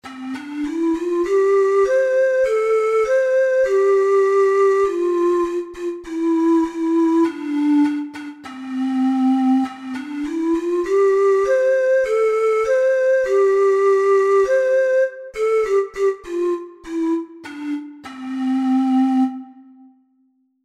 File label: teapot, ocarina four hole